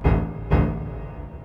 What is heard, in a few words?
DEATH; TEAZLE